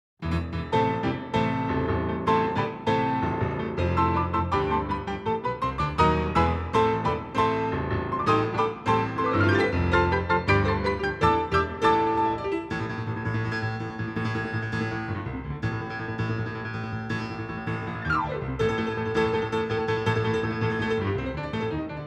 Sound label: agitato